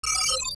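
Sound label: screen close, computer; federation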